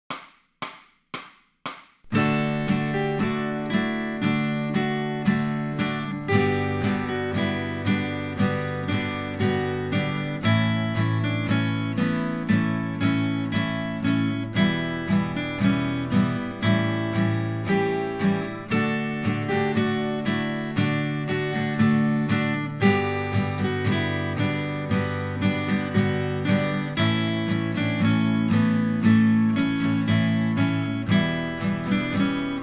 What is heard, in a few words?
Accompaniment, acoustic guitar; Grade 2